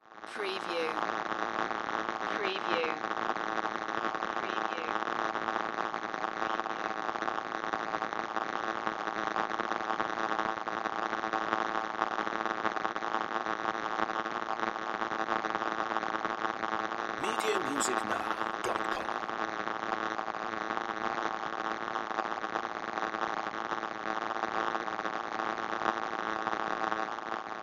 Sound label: Electric Arcs Constant; Sound Effects Bible